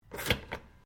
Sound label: Toaster Popup Lower; Various kitchen appliances; Kitchen (Sound Effects:Household)